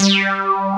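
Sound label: ACIDIC BASS; Pro-Rec - Synthsonic; TRINITY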